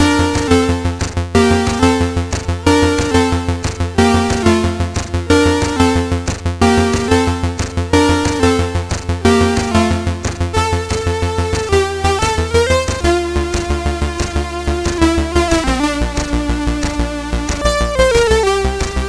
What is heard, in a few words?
Paddle Mania, CSG8580R5